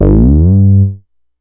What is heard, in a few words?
Synth oneshot Slide Up G, Synth Bass; Slate Digital BASSHOLE Sample Pack